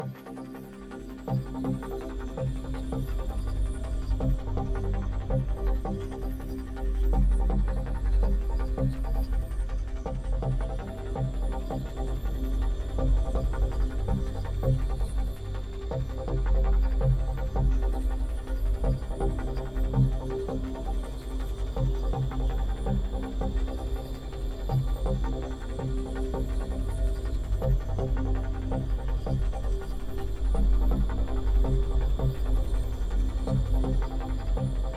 Menu Ambiant; Rogue Singularity

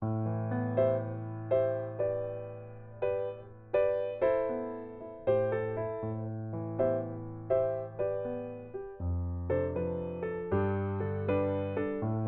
Theme Piano; edge of eternity

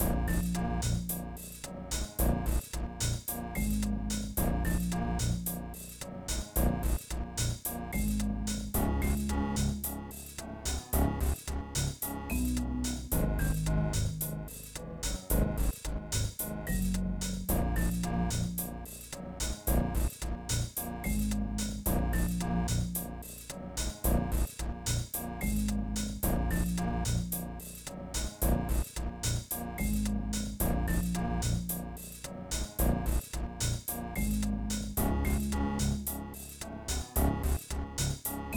Police HQ (Sneaking); Sly Cooper and the Thievius Raccoonus

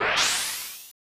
Beam

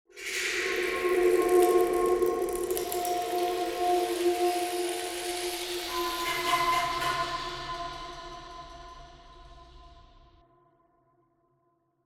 Woosh; From Dust; ps3; xbox 360

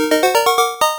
Round Complete; Teddy Boy; Master System

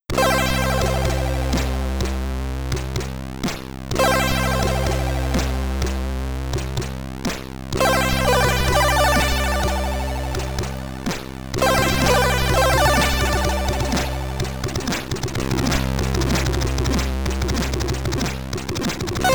Ending SID Stereo

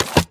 Menu Stone Panel Open

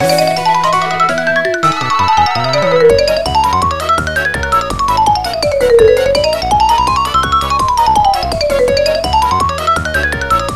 Music Park (Final Lap, Marimba); Mario Kart 8

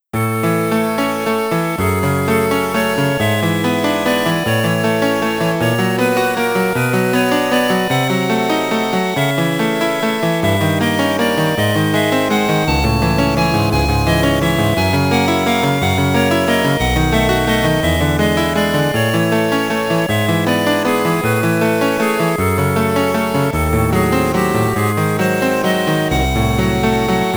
Romantic; The Even More Incredible Machine macintosh macos gamerip 1993

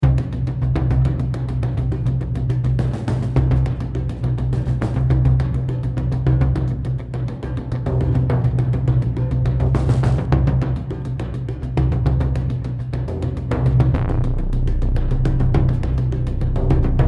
Theme Edgy No Guitar Loop

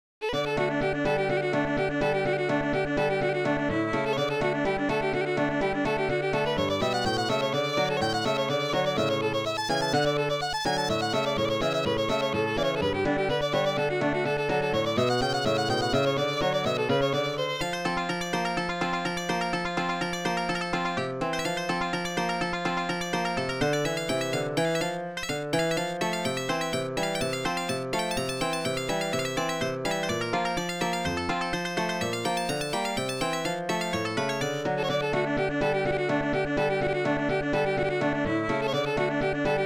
Hornpipe; Sid Meier's Colonization; amiga

